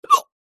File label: human male single hiccup